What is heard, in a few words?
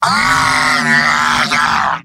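heavy pain critical death; vo; tf2; mvm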